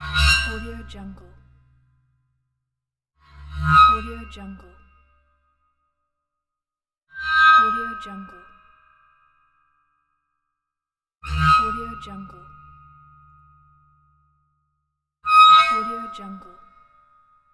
hard metal friction squeaks

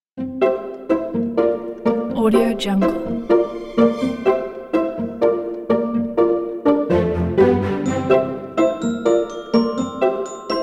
cartoon comedy

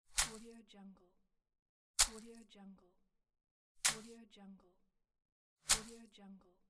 wet sponge fall

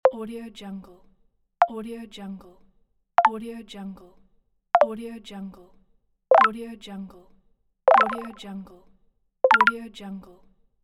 interface sounds

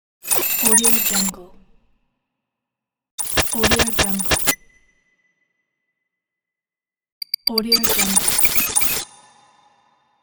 glitch logo reveal intro

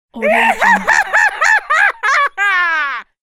male creaky scream laugh

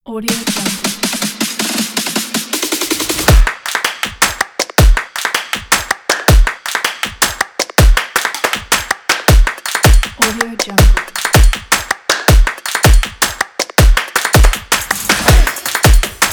this is drums; garagesound

